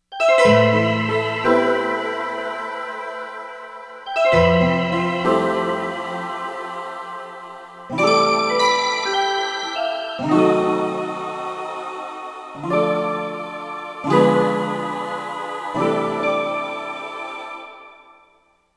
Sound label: Vox Piano